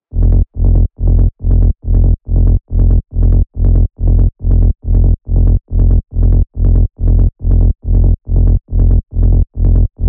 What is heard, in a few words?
Bass F 140BPM, Techno; Rave; Melody Kits; Stems; GhostHack; Ghosthack - 7 Free Rave Melody Kits 2025; Kit Error - F 140BPM